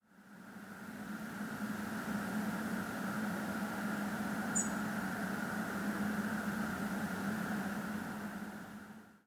Erithacus rubecula; SETE

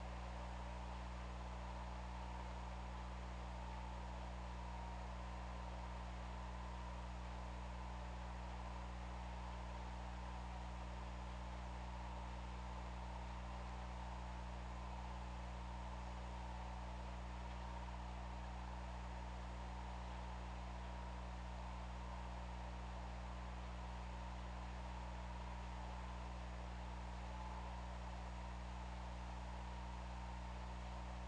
Orca; OrcaLab

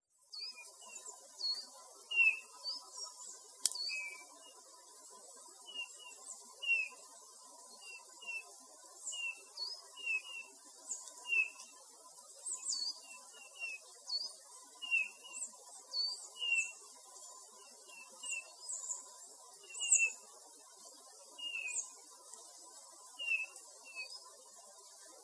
Pyrrhula pyrrhula; SETE

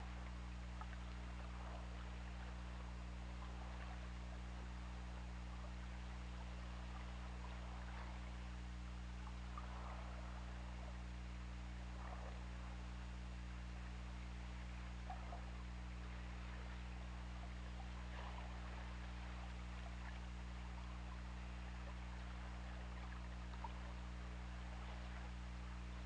Orca; OrcaLab

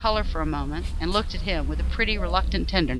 noisy

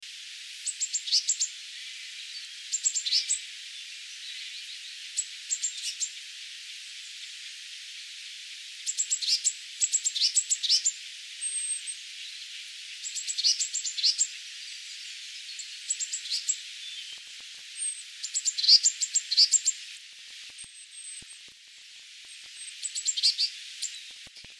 Cyanistes caeruleus; SETE

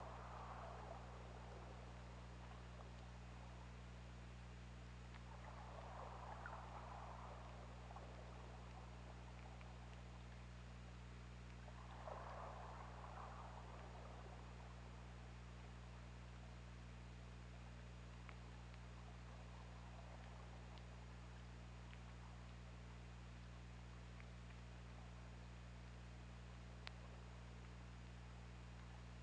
Orca; OrcaLab

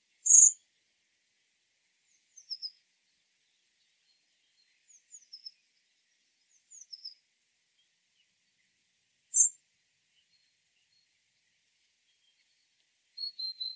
Turdus merula; SETE

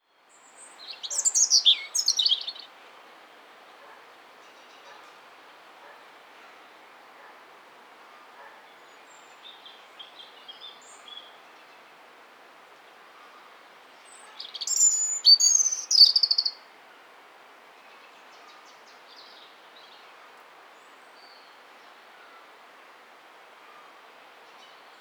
Erithacus rubecula; SETE

